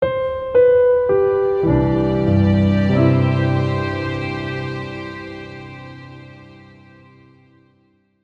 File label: keep thinking